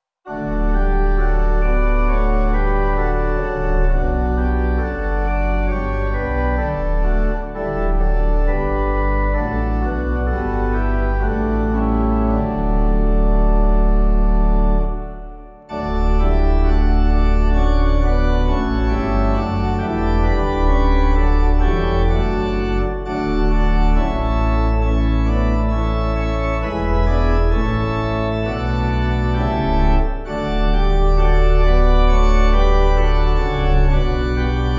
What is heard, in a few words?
Pipe